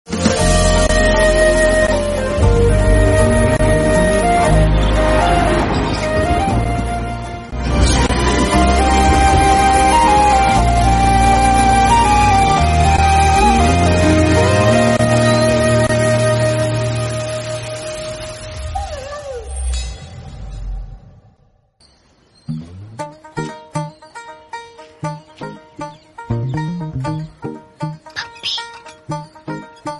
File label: bgm kannada; 777 charlie; rakshit shetty